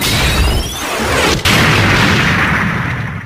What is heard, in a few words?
super jump; freak fortress 2